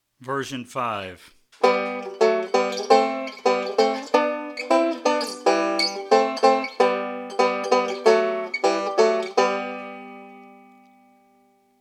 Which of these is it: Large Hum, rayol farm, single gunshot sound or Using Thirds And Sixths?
Using Thirds And Sixths